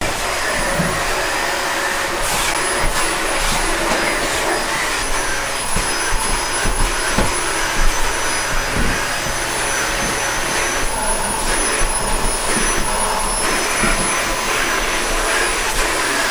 chistka pilesosom

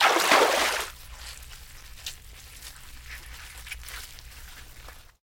medvezhij ulov iz reki v taezhnom lesu

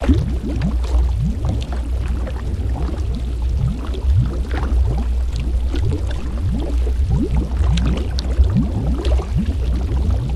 nespokojnie morskie volni omivayut ostrov

